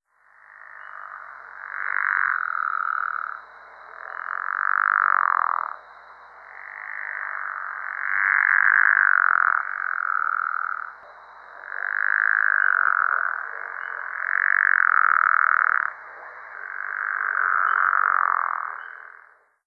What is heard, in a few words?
Pickerel Frog call